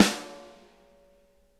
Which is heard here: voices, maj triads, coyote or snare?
snare